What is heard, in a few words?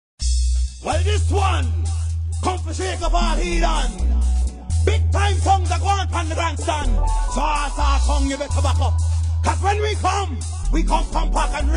reggae